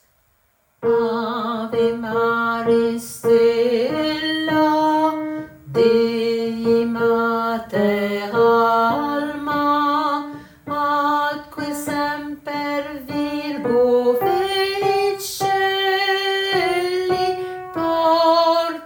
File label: Alto; ave maris